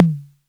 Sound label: Tom High, drum kit; Polyend; Stazma & Concrete Collage